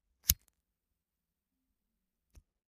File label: butane lighter